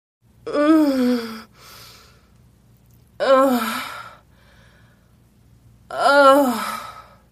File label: moaning